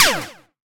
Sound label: Blaster Double Kickback Stereo